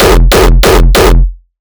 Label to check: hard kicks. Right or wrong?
Right